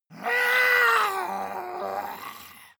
monster growl high; schreie

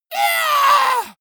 monster growl attack high; schreie

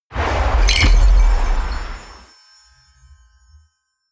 magic spell storm wind bells; swooshes-swishes

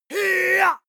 male attack shout long; schreie; menschen

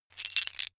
metal pieces clatter short

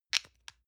lotion lid open hard; berufe; friseur

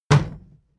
plastic box big kick hard; maschinen; metall; soundpool

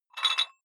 dumbbell take off rattling weight, fitnessstudio

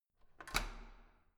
door key unlock

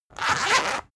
zipper run long fast; objekte; reissverschluss

